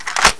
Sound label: scar clip in, weapons